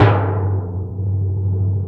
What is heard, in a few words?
PRC F SFZ, Orchestral Perc, Timp; Roland LCDP03; Ambo TimpRol